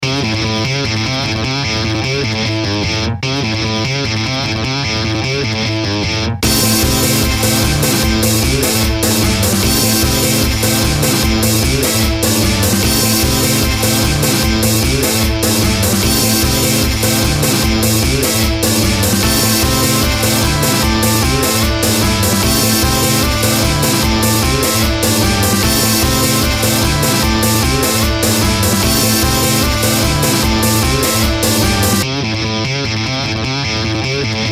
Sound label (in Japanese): ジングル 前進、信念 BPM150